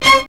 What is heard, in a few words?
DISCO FX; Zero-G; Funky Elements